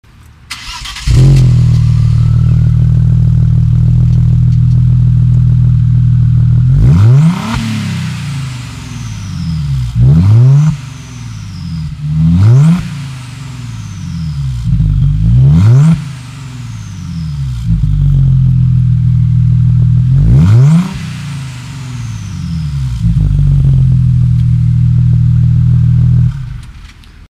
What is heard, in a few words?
outside revs, exhaust